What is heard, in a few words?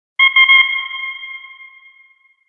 beacon; tos